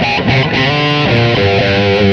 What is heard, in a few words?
guit lead